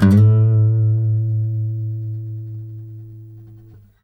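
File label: trill, Guitar; Quantum Leap; Guitar & Bass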